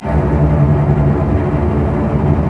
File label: STR SEC, Roland LA Composer STR ORC FX; Roland - LA Composer vol. 1